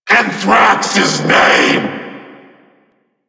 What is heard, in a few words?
Vocal Attack; Warframe; Duviri; Dax; Vocal Set A